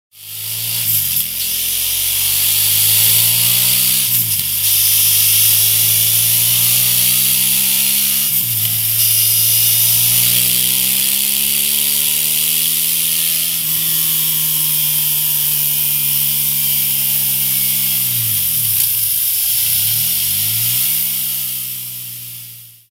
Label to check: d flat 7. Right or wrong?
Wrong